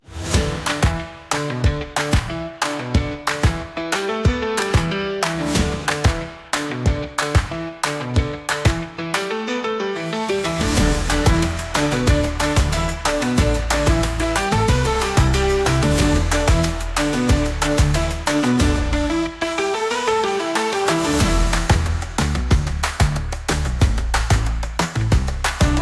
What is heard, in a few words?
Stomp